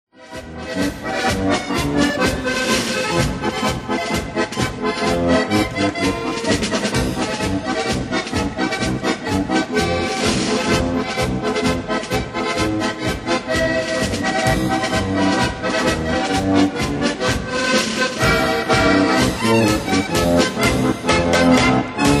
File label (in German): Akkordeon, Marsch; Kirmes-Orchester; Akkordeon CD